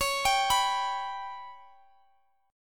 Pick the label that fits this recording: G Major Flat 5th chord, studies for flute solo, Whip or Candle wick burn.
G Major Flat 5th chord